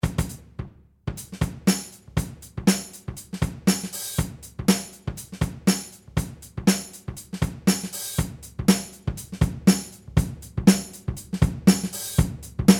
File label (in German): Pokket Beat Akustik LP Boost Cut